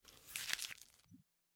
footstep mud; weather and nature

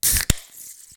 draft guinness can open; sonniss gdc

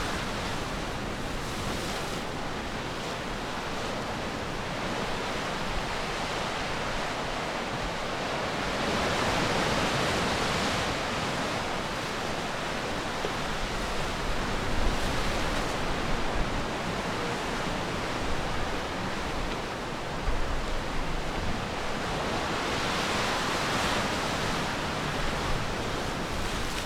coast mono; fvtt sonniss gdc audio

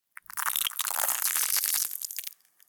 designed ice raw; sonniss gdc

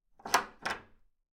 wooden unlock clean, doors

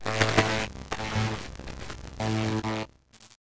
shocking grasp, cantrips

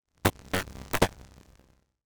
melee attack impacts magical effects lightning; psfx

